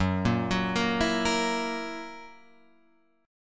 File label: F sharp 7th, Guitar, Open F; 6,5,6,5,7,5; arpeggiated